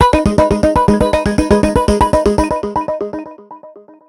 Trippy Panpipes

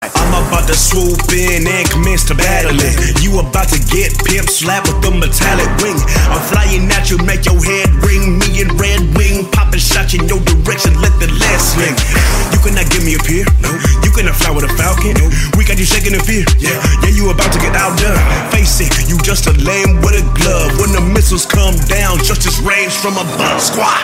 Rap

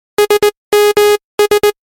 SMS Morse Code

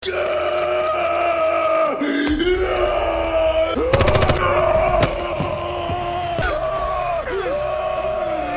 yell; The punisher

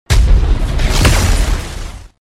Shazam lightning

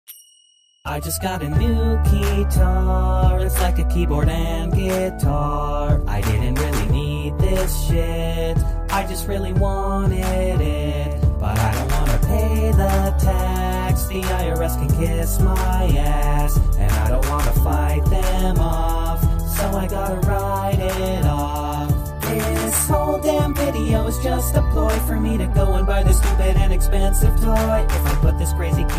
keytar; Gus Johnson

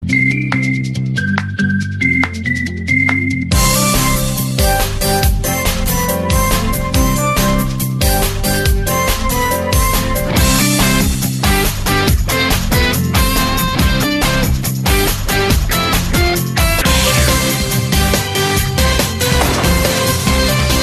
Commercial; Kia

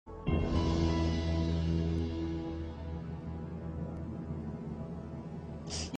Option Lock Tune; Kbc

